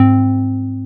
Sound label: Cat Note; Zero-G Jungle Warfare 1, 2 & 3